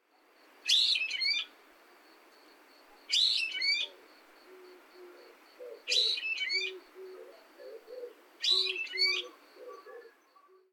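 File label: hiyodori, Brown-eared Bulbul; saitama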